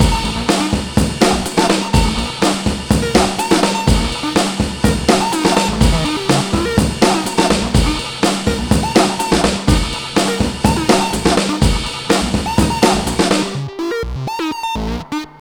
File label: TRAILER BREAK 124 bpm; POTBreaks